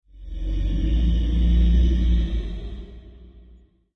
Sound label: ghoul